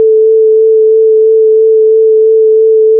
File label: fm vibrato